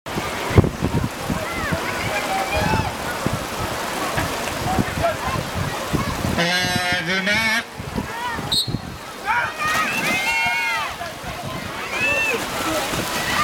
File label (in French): musique; natation